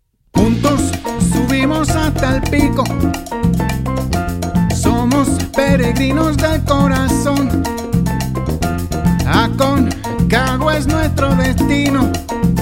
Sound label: Rumba; Aconcagua